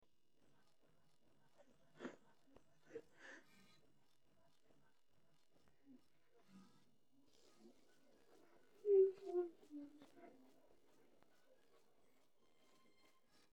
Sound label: surprise cat kitty cute kitten